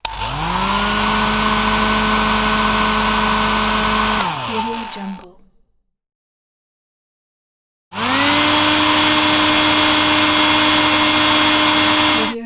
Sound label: hair dryer